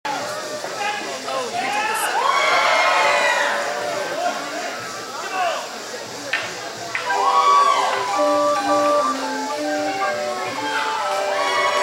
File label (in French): flutes a bec